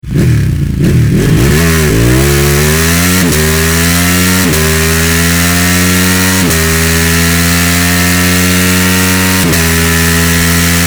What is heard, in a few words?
Panigale long Track